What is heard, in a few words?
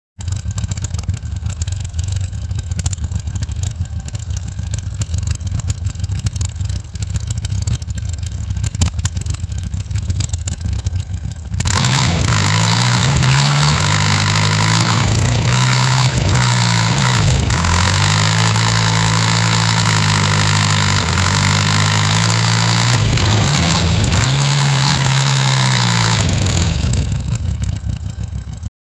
harley motor sound, motorcycle